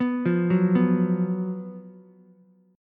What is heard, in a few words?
Gb Major 7th Suspended 4th Gb Ukulele; GbM7sus4; arpeggiated; JGuitar